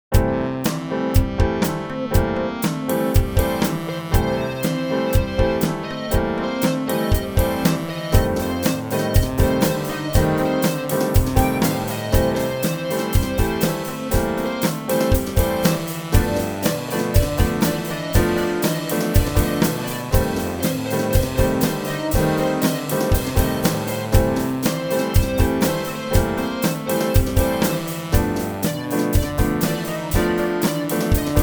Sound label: MIDI blues